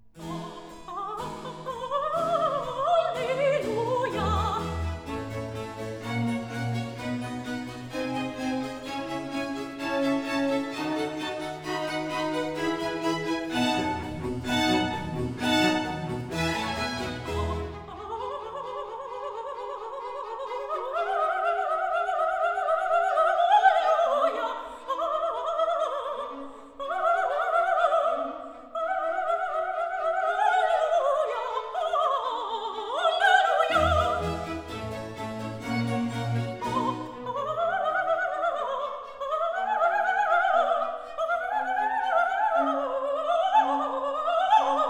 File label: Allegro; Antonio Vivaldi (1678 - 1741); Vivaldi - Mottetti (2003)